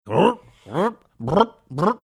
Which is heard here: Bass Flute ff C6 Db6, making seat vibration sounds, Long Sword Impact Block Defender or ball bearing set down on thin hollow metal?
making seat vibration sounds